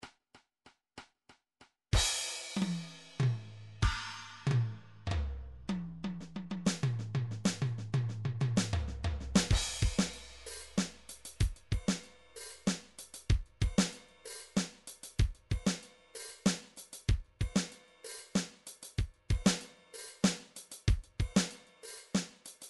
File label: odd meters; Drumtrax Library 2